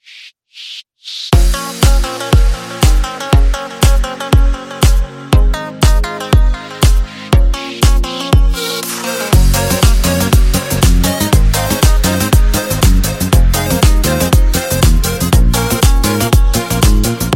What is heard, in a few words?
arabic